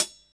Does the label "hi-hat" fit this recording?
Yes